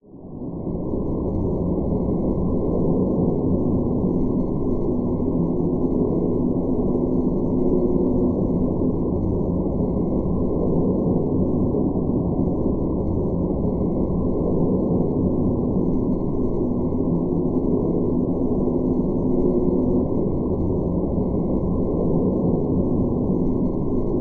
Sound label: science fiction atmosphere drone tone